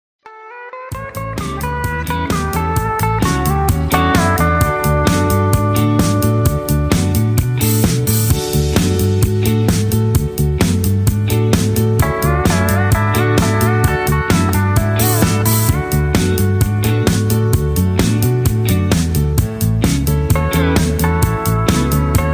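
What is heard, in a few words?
Instrumental No Leads No background vocals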